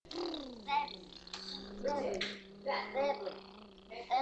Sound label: cow